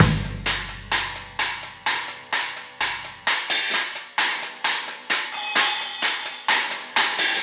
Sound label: Cymbal and Kick